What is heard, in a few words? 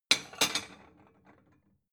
plate small shelve slide